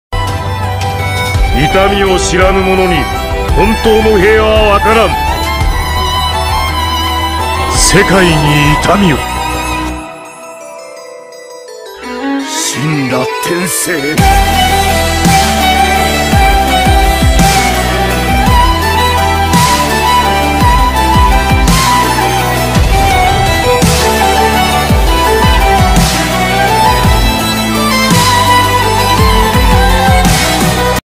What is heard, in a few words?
Ringtone Remix; Pain Shinra Tensi